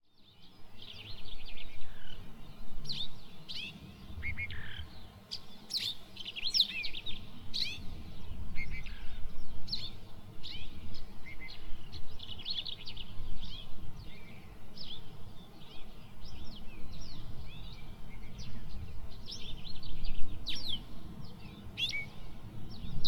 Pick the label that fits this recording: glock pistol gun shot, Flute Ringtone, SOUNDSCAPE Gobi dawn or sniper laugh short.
SOUNDSCAPE Gobi dawn